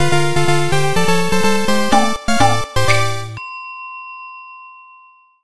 Jingle Napping; Dandy Dungeon Legend of Brave Yamada

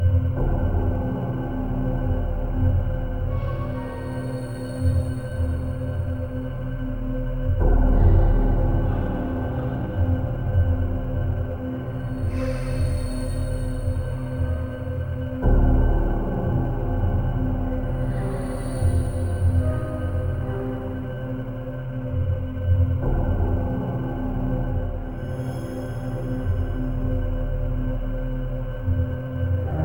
Ambient spook loop

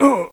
Human Old Hit; the battle for wesnoth

